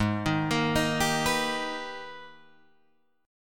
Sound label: Minor Major 9th, Guitar, Open G; Gsharp; 6,8,8,8,8,9